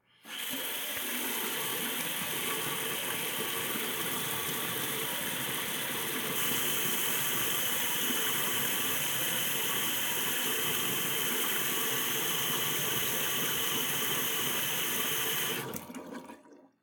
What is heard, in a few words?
sink, running, maximum stream of water, shut off